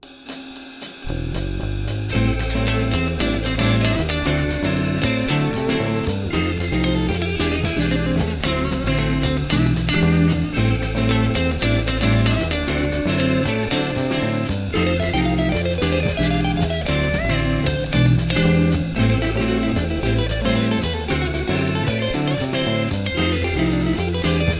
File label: Gypsy Jazz